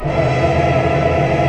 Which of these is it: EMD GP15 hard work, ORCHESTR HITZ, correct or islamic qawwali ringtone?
ORCHESTR HITZ